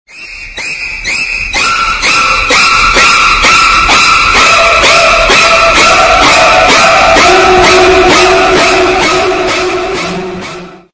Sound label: horror ringtone